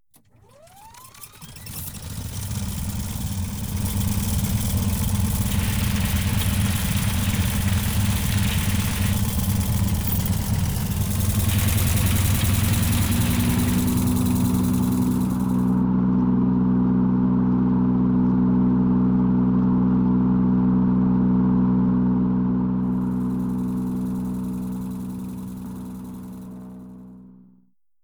Cinematic airsport airplane; adams venture origins

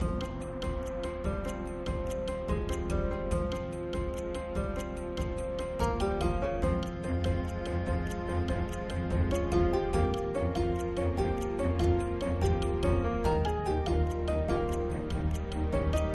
Chinese New Year Battle Music; dragon city

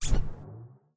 Trampoline Teddy Bear; doodle jump 2; android

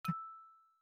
Chip Landing; My Cute Commissar

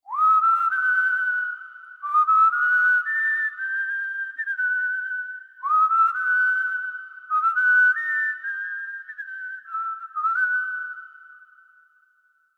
Whistle; Age of Conan Hyborian Adventures; Whistle to the Crumbling World

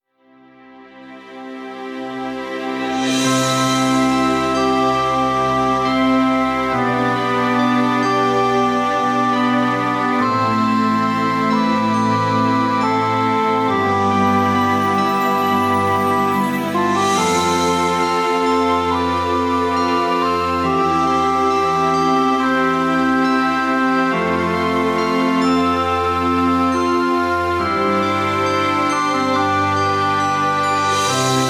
Jingle Heartful; Taiko no Tatsujin V Version; PS Vita

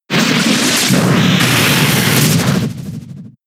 Drill Run; pokemon sfx gen 6 attack moves xy oras

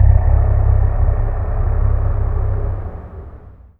Deep Breath

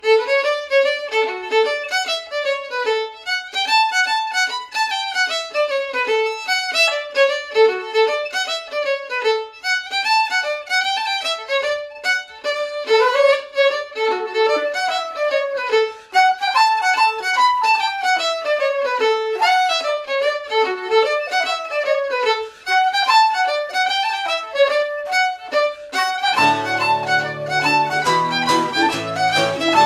Orchestra Ambience; Assassin's Creed III

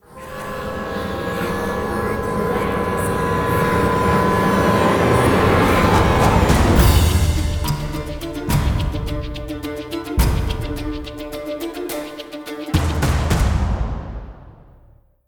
Transform; The Witcher 3 Wild Hunt; CS103 Poroniec

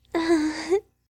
Cuddle

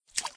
Puddle; Doodle Jump 2

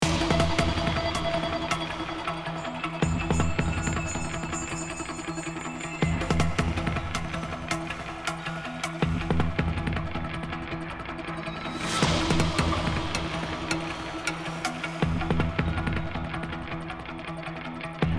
Exterior Music Stress; Sin Omega; Deus Ex Human Revolution

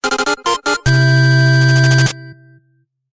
Boss Clear Fanfare (8-Bit)

